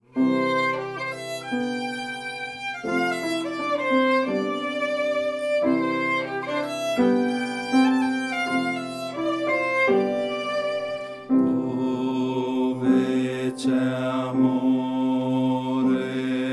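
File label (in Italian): Dove C'e Amore, bassi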